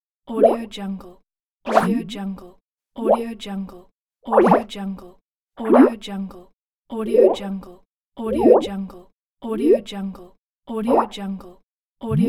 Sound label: liquid motion sound effects